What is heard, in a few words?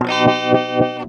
guitar